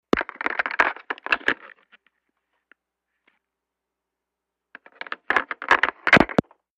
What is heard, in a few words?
Telephone fumbled in-line pick - up, handling noise, hang - up; Futz Movement